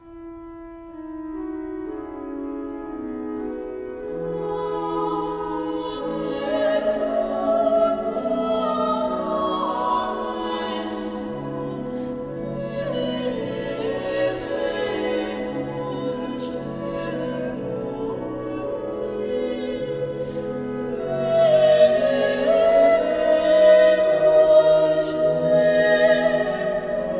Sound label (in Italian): voci